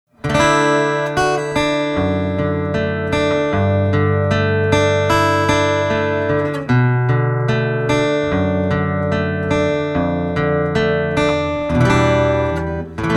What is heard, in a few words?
ARP PICK APX, guitars; musical-instruments; el-ac-guitars